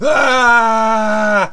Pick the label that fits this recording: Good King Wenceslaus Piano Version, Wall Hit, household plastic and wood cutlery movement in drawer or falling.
falling